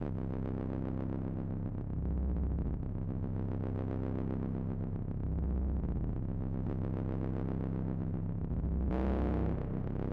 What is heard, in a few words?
BASS; rain world